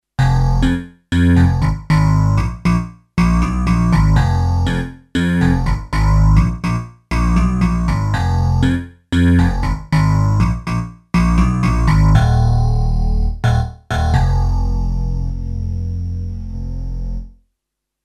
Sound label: Bass XT